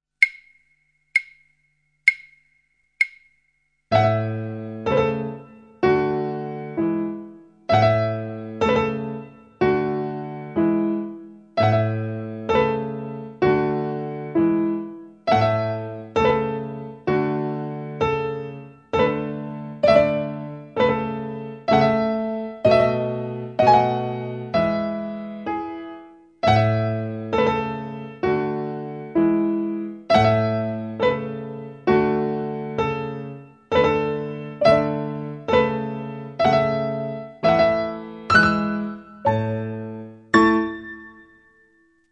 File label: piano